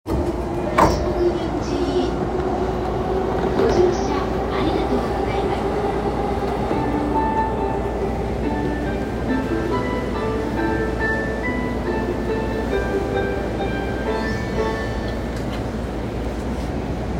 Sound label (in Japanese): 中央線快速西国分寺東, train sounds